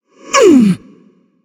grunt hit; smite; nemesis

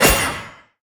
burn start; smite; god daji skin15

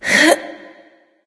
grunt lift; smite; aphrodite

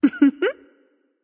heh heh light; smite; voicepack; hel

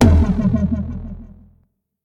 taiko high; smite; raijin

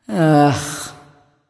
"ugh"; smite; nike

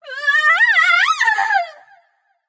fear light; smite; voicepack; hel

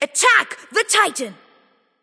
vox attack; smite

